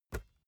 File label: basketball catch soft